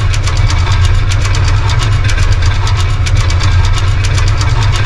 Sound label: rotating panel; speed flings